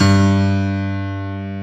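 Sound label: acoustic piano; Pro-Rec - Synthsonic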